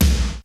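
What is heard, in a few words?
blast tom, Roland JD-990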